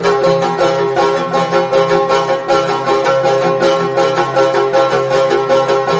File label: banjo; music